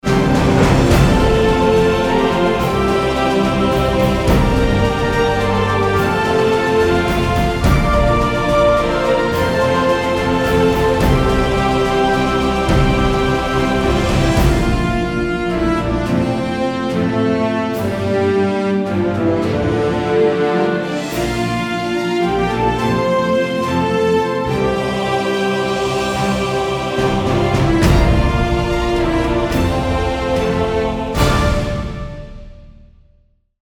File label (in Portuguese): De Alto Impacto, Orquestrais; trilhas; Mjc Music